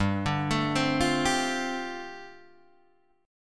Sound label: G 7th Suspended 2nd Suspended 4th, G, Guitar, Open D; down